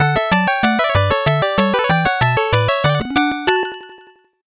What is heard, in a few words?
8bit jingle fast